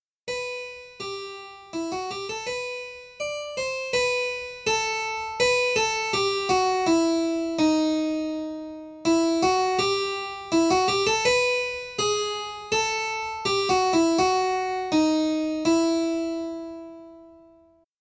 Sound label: Melodic Dictation